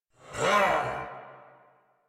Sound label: Attack Effort; Warframe; Dax Knight; en